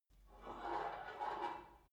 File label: take off light weight barbell soft; sport freizeit